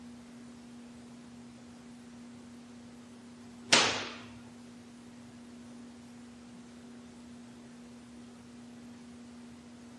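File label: Ballroom Static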